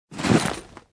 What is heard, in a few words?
hint equip